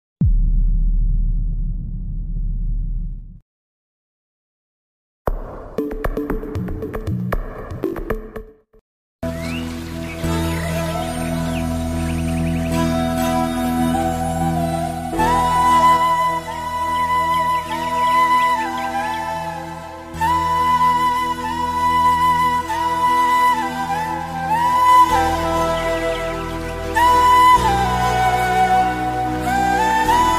best flute ringtone indian bgm ringtone

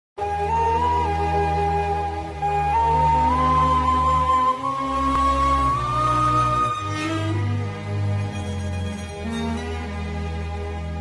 tamil movie bgm; ghajini; climax

